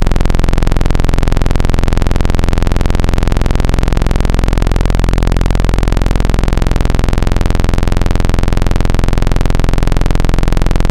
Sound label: Saw Dual; Synthetic's Serum Collection; Echo Sound Works Sphere